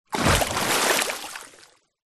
nature water movement splash from underwater to surface